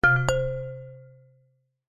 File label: multimedia alert notification ui ping chime mallet like error; zapsplat